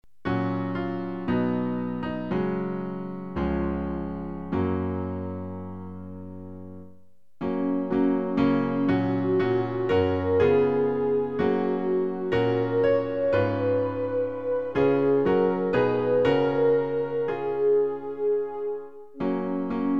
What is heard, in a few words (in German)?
Klavier-Playback